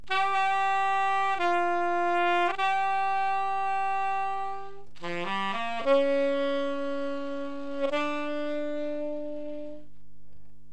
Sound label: sax